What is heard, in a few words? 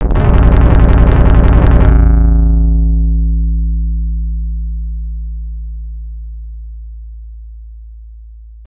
Stage Clear